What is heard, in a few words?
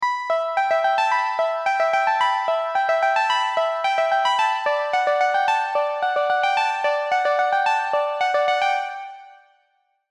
Melody Em 100, Ambient & Downtempo MIDI; Movement; Ambient & Downtempo MIDI Melody Collection